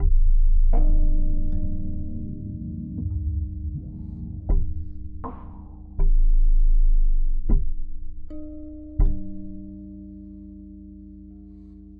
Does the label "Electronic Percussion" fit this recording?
Yes